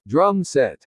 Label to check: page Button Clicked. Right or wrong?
Wrong